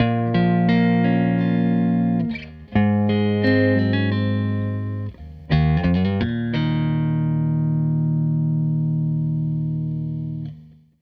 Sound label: Tele Guitar Loop